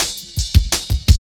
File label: Jazz Ride Cut, Drum Loops, 166.5; Jungle; Zero-G Jungle Warfare 1, 2 & 3; Jazz Ride B; 90 s Sample CDs